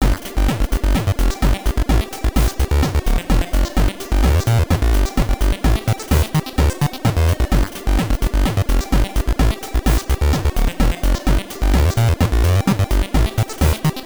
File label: Conversation; Shantae; GB